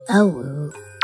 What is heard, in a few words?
awoo